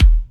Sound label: EDM Future Kick; Big EDM; Future House Tools; Kicks